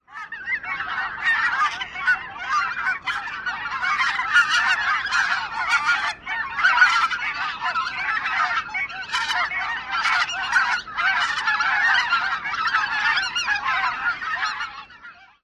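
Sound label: calls of flock, bird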